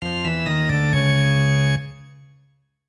game over na organe

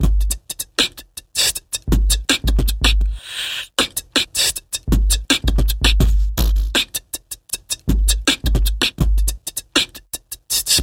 zvuk bitboksa

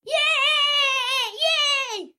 zvuk radosti detey

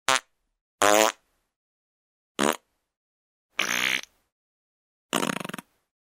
puk cheloveka; Издавание человеком неприличных, но естественных звуков портящих воздух

